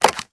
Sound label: phone pick